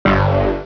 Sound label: beeoong